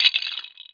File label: fall apart sound; lego star wars